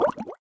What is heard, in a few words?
potion click